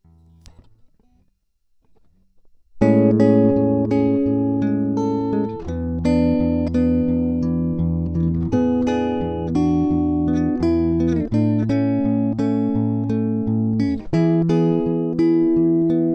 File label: New Wave Chorus